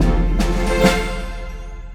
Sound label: gameover; hardest game on earth; epoch times